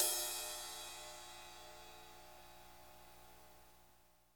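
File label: ride edge, drum kits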